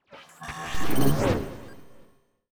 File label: transitional confirms